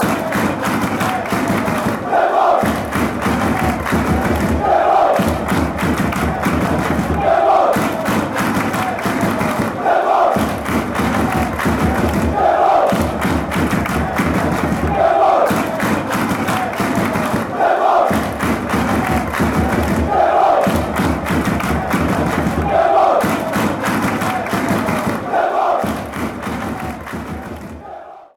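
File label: fanchants, football chants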